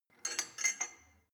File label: weight bolt insert, fitnessstudio; sport freizeit